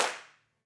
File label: Sweet Claps